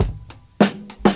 115 bpm fresh breakbeat sample f sharp key, kick tuned to the F# note